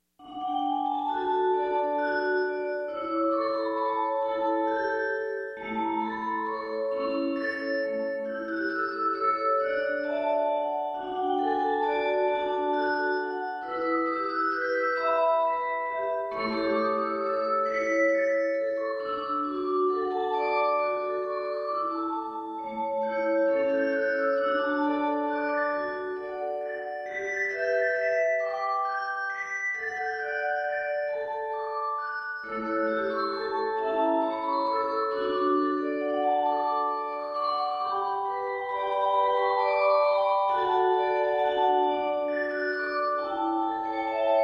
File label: music box